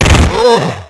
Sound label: fall; quake2; ranger